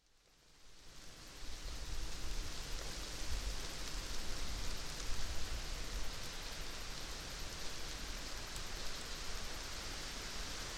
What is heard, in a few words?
Aspen Grove; david fields